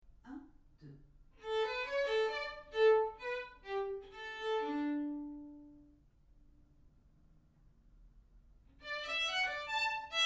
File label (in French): Violon seul; Sonate no 2